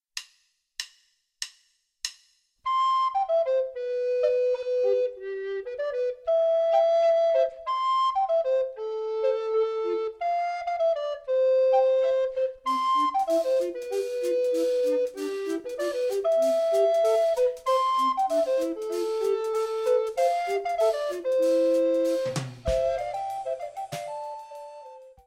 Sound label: Morning jazz